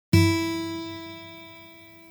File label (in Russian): Pervaya otkrytaya struna